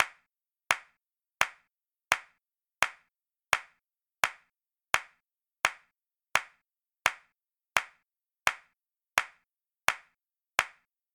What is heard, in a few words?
Countinous Clap